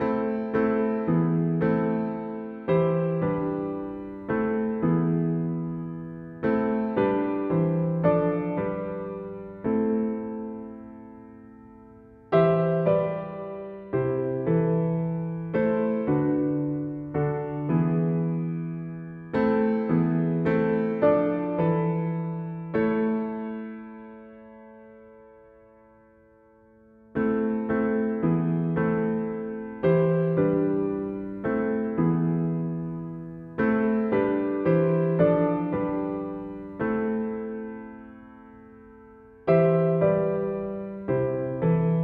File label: Christmas songs music; A Coventry Carol